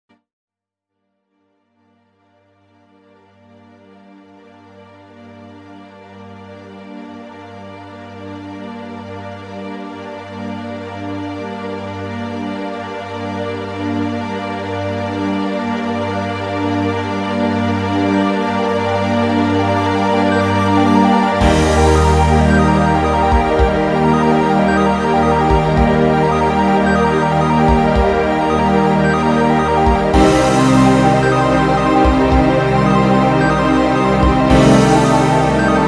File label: ending music; Huebots